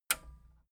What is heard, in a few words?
metal button radio release disable